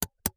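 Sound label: computer mouse click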